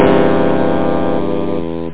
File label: clav maj4